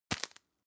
tissue tap hard

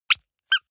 squeaker squeeze with release hard

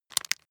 bone break small

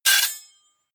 hit metal sword small

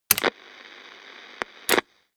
turn on off

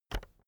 keyboard external enter push

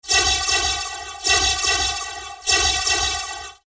finished phaser

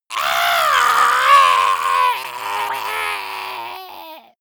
monster death scream high

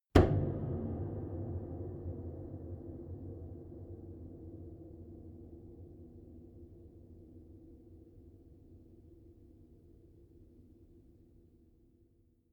piano body hit

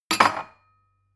glass shelve hard

